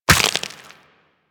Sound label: Walk Bone, Footsteps; Warframe; Operator